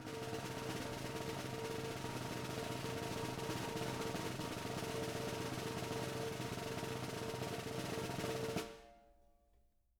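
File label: snare roll pianissimo; Sampleheads; Peter Erskine Living Drums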